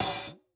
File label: hat open close